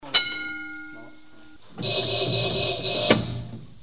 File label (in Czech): Zvuk klec